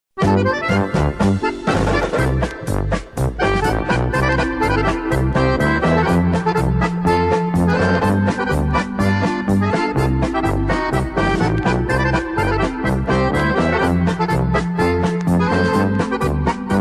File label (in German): glocken polka instr